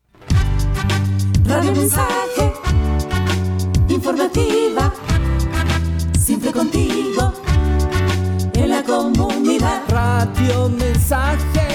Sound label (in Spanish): JINGLE RADIO; RADIO MENSAJE